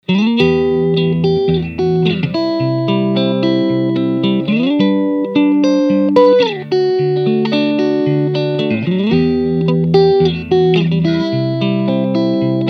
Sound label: bambino normal finger strat; Reason Amps “Bambino”